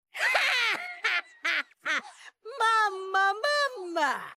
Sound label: big mom laugh